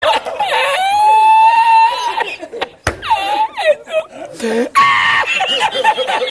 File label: Laughing My Ass Off